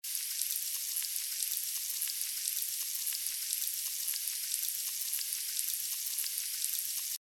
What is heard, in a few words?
sparkling noisy